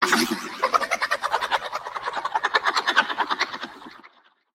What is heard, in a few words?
Alien Laughter